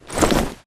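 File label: grunt movement foley loud long; Titanfall 2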